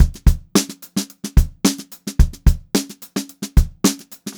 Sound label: GROOVY BEAT, Funk Groove Drumloops; USB Soundscan vol.38; AKAI